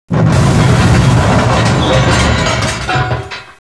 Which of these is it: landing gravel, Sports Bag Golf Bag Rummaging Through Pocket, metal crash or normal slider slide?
metal crash